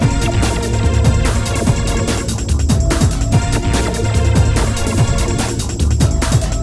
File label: Hardcore Breakbeat, 145 Bbmin; Big Fish Audio; Hardcore Breakbeat Action; Suspicious